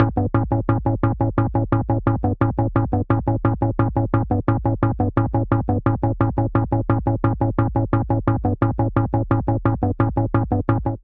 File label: Bass A Minor 87 bpm; Bassline; Tonal; MIDI Clips; Public Announcement; Drive and Glow; Ableton; Factory Packs